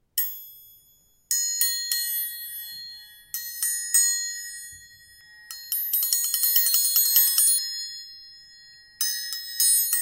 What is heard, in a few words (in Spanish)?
Triangulo